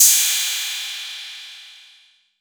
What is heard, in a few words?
PERC 808